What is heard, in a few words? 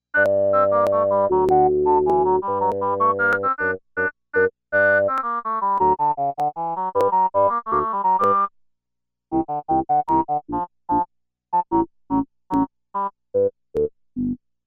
Double Reed